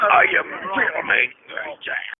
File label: pain; svencoop; morass beta; armorman